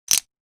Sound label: chip collide